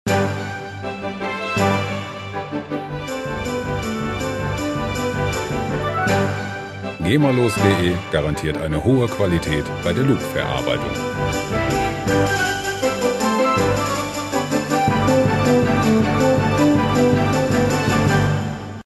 trepak

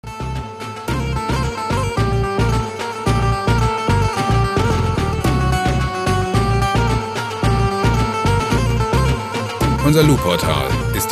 dhol

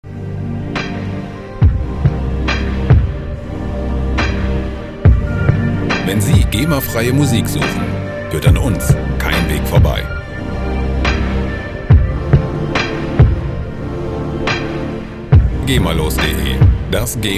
choir noir

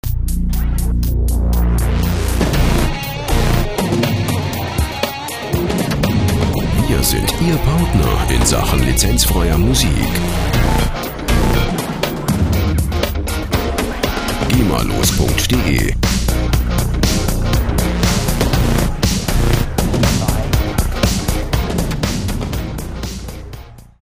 thunder toms

